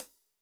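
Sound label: Wet Random, Alesis SR16; WetRandm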